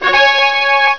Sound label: doop; wizardwars